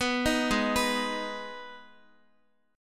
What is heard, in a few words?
Ab Minor, down, Guitar; Abm arpeggiated; JGuitar